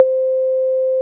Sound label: analog conga, Yamaha TG-500